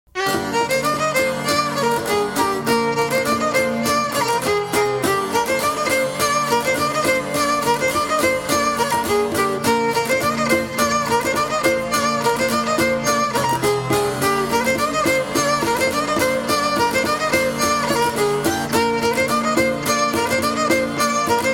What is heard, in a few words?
Ikariotikos